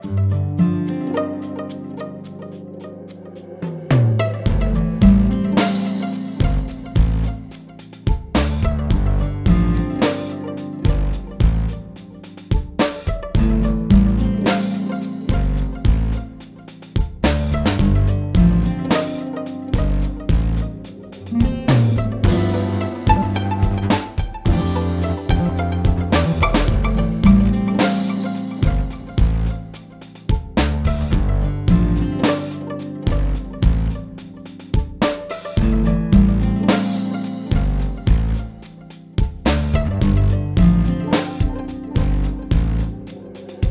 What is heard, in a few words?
bugcity bgm loop 108bpm Amin